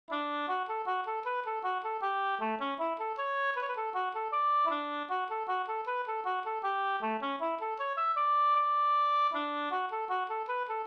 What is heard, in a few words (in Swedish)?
hambopolkett; Konjaken kostar en a sjuttifem Sd